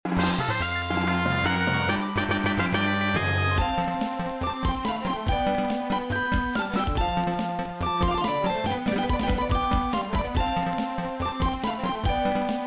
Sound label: Victory!; Team Aqua & Magma